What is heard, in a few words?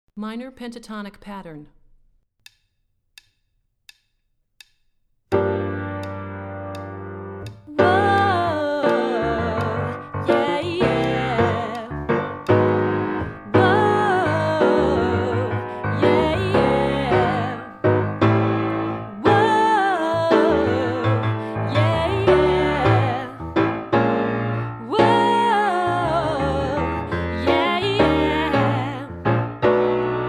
Minor Pentatonic Pattern Low, warmups